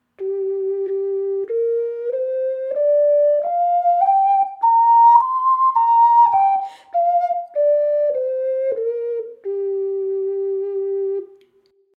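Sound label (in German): Indianisch G, Indianische Ocarinas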